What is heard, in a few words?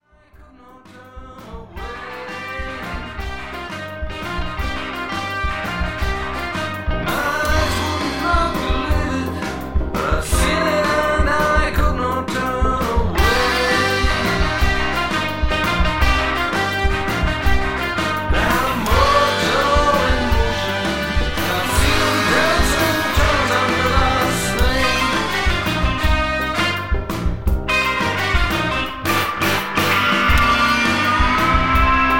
conga